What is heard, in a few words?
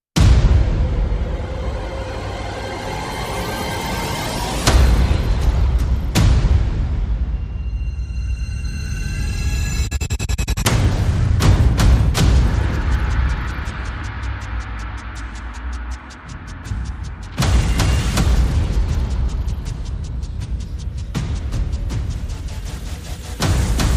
Perc and FX